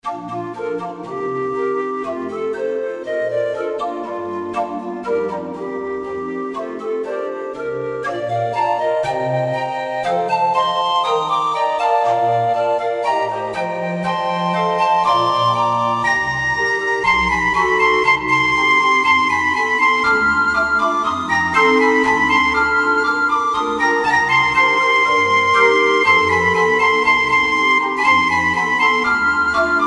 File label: steam organ; oldmans steps